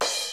Kawai r-50; Drums

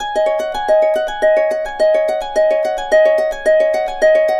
G# 110, Complete Harps; USB Soundscan vol.62; AKAI; BRIGHT110

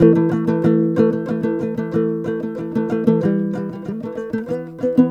TOPSHUR; Soundscan vol.60; Asian Traditions; AKAI

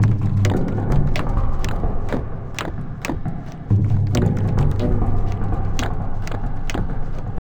Tabla, 130 BPM; Zero-G; Pure Tabla

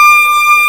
VL TEN D5-EQ, STRNGS

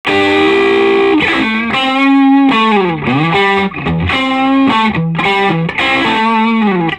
Vintage Blues Guitar, SOLO

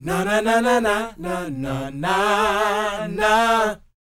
NA-NA, Vocal R&B & Dance; ILIO; Vocal Planet VOL-4

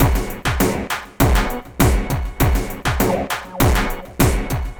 xtra tech beat; Sonic Foundry (Sony Creative Software); Ugly Remnants VOL-2

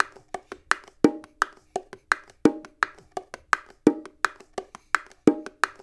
LOOP, Percussion

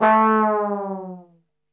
A 1X TRBL OF, Brass; Best Service; Brass Super Section; 90 s Sample CDs

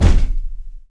HUM FEET S, Stompin' Feet; Roland LCDP14 Africa VOL-2

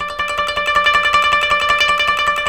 KOTO TREM

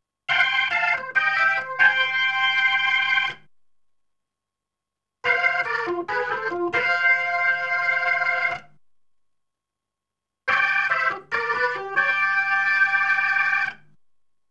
B3 Organ; Masterbits CLIMAX 8

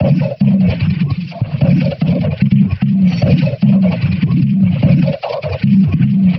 Jungle Loop; Sonic Foundry (Sony Creative Software); Esoterik Beatz

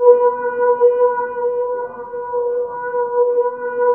GLISS LOOP, AMBIENT LOOPS; Zero-G Ambient 1

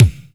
PPG KICK; AKAI S6000 CD-ROM - Volume 4; Kick 2

